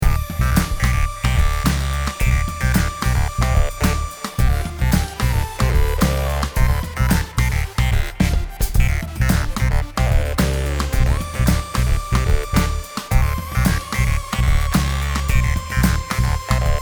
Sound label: song